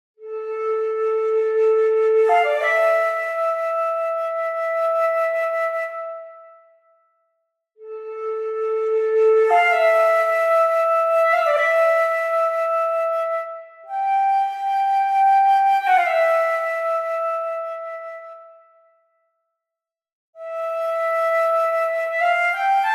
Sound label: Flute Legato